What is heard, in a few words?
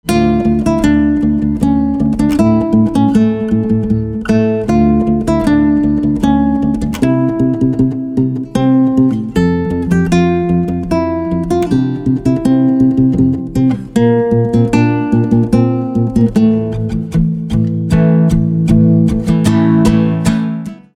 Guitar